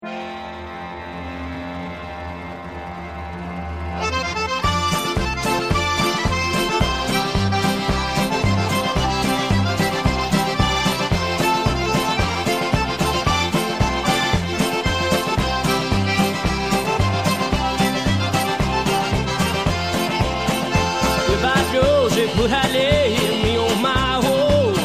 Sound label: French, New Orleans music, Cajun & Zydeco